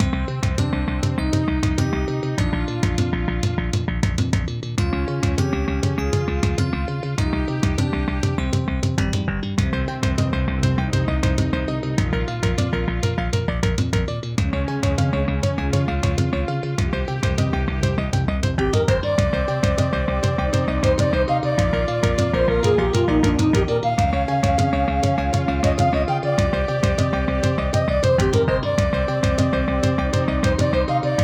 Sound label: Yamaha DD-55; Introduction; Hocus Pocus